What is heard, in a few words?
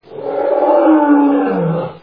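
majestic roar of a lion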